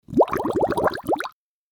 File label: Cartoon water bubbles short